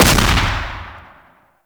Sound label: AI 3D Fire; Hek